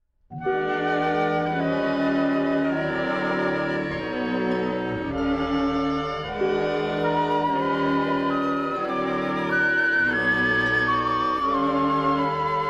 Pan Whistle; Sinking Earth

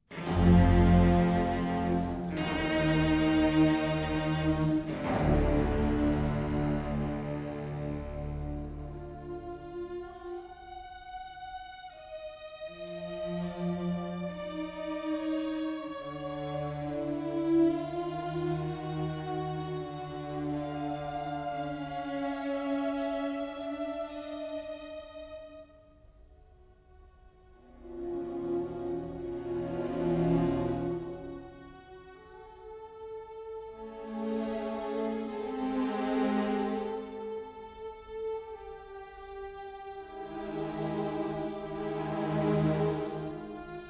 A Narrative for String Orchestra, in three parts; Psycho; Alfred Hitchcock

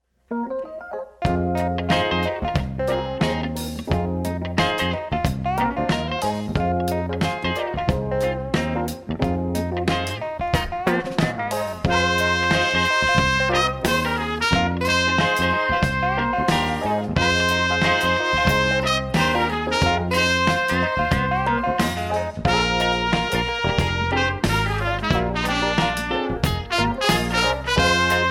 Street Corner Boom Box; Chicago Story; original television scores

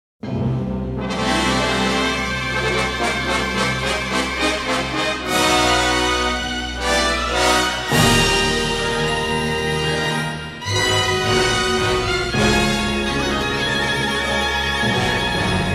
Fanfare And Prelude; Time After Time

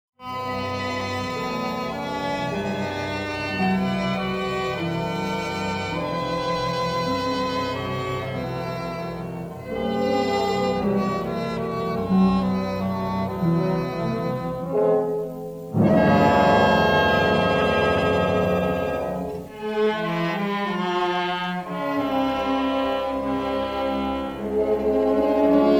Strange Noises

